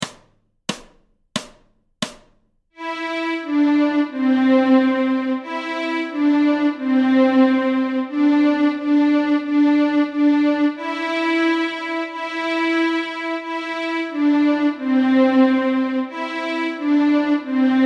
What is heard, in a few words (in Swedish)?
Stråk C, Fiol